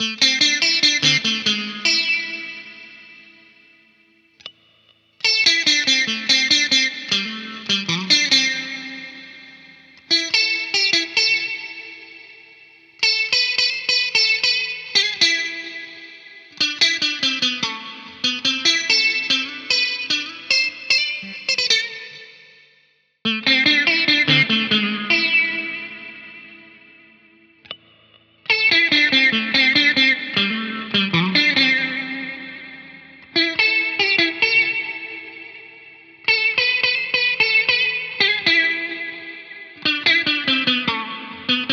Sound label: Tapewave Sitar; Wave Alchemy